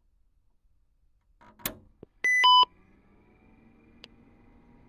nec pc9801ux21

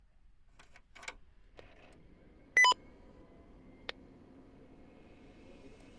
nec pc9821xa16 w16

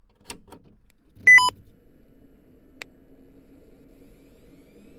nec pc9821ap3 c9w

